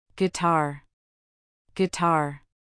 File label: guitar